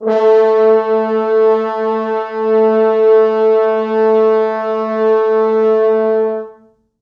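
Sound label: 4 FrHorns SUS, French Horns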